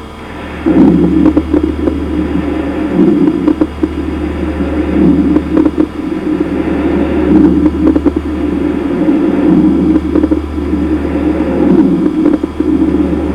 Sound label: COURSE RALES, airway; emt